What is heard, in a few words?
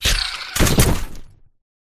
Rat die